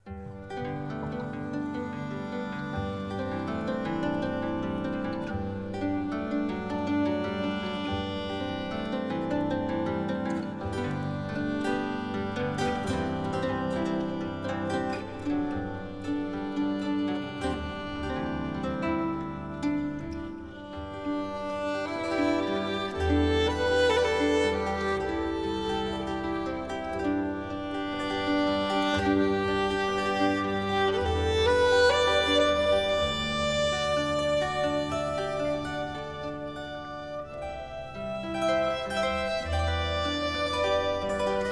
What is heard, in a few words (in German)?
Appalachische Weise, Zither; Traditional/N-Amerika; (live); Violine, Zither